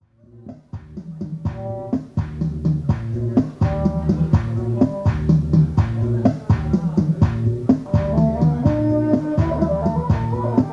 Ethiopian Musicians at Addis Ababa Restaurant, African Field Recordings; Bruce Miller